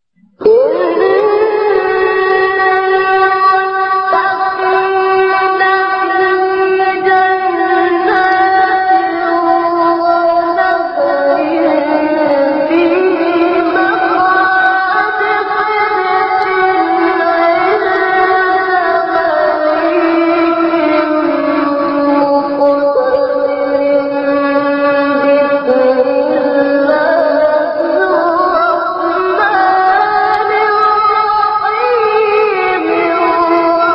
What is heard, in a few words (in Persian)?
تلاوت زیبای از سوره قمر الرحمن; محمد اللیثی